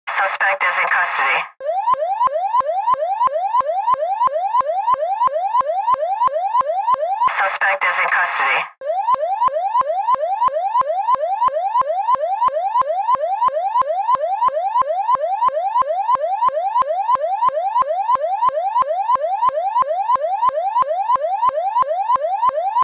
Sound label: New Police